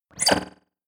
Future Telemetry Glassy Button Press Interface Delayed Space; Sound Design; Tech Sound Effects